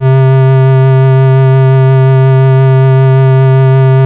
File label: Casio VL1 Flute; Solo-Synths; Giga Samples Collection